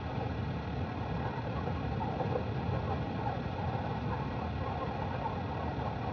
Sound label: Ambience Loop, outdoor